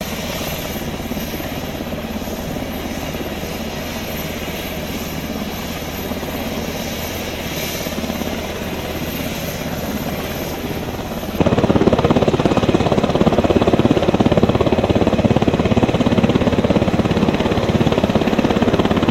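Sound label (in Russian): продолжительные звуки вертолета в небе и мужские голоса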